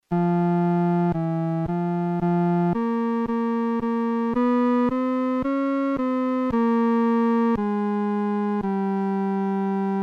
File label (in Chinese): 独唱（第三声）